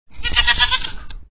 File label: GOAT